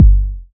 low kick drum single shot g key, Royality free steel kick drum tuned to the G note. Loudest frequency: 136Hz